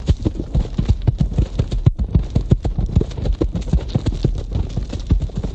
Horse Gallop Heavy

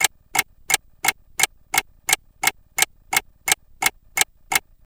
Clock Alarm Tick Toc, ticks

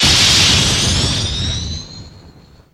Underwater Laser Fx

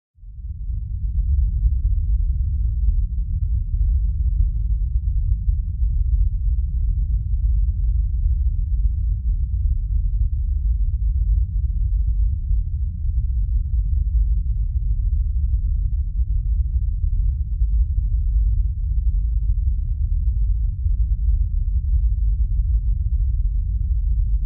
LFE Electronic Rumble with Low Static, LFE

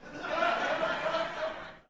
Crowd 100 Short Medium, medium int

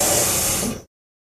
Water Fountain Off

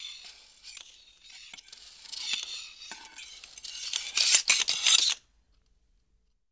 Ice Skating Group

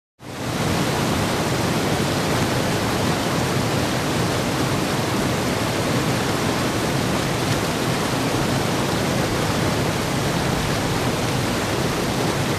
River Large Fast Run

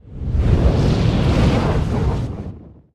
Dragon Fire Whooshes; No Crackle Element X 10

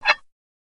Squeak Tiny Screw, screws

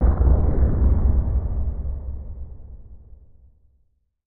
Bass Impact Deep Sea

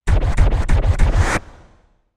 Music Record Scratch, record players; Music Effect; Lowered Record Scratch Sound.

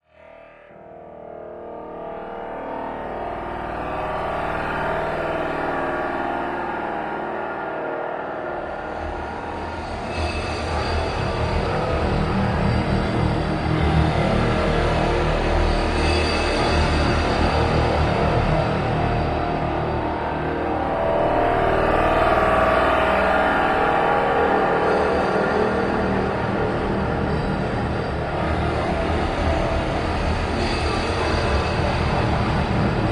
Metal Shift Hvy Shutter, granular

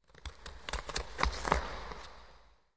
Ice Skating Skate

